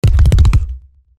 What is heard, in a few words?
Crispy Big Breaker, Breakers & Transitioners; Best Of N2O Library